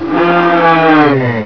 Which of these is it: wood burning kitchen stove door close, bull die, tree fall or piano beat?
bull die